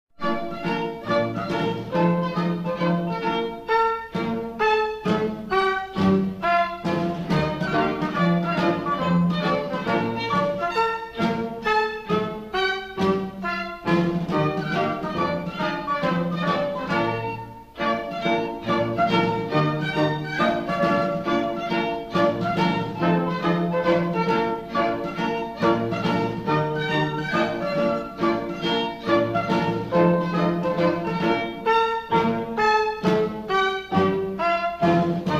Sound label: Danish Dance